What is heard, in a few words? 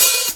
soft sounding open hat b key, open high-hat sound tuned to the B note